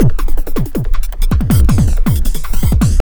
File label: JUNGL 160, JUNGLE LOOP